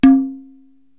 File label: Tabla Open, Korg TR-Rack